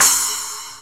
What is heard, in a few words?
TICTALK DRUM